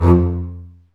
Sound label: STR, Cb Bowed, Cb Marcato; Roland L-CD702